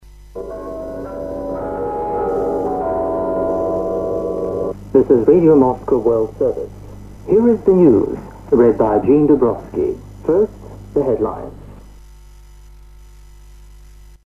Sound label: Radio ident; Radio Moscow